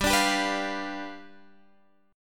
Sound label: G 7th G down Guitar Standard; G7 strummed; JGuitar